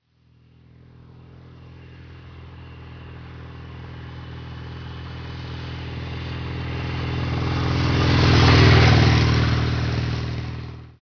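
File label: norton, motorcycle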